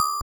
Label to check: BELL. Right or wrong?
Right